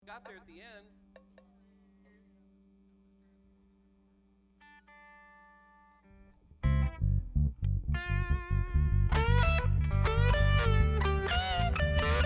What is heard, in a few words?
Strings Mix